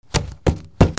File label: OFFICE